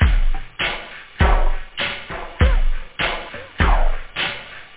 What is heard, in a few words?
tom, loop house; da capo vol1; instruments